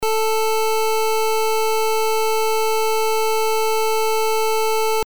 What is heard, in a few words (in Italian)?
Onda rettangolare a 440 Hz